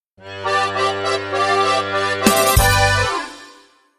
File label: Zydeco, Sting